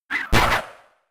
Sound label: Punch; Shadow Punch